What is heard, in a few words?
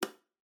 Electric Fan (Switch)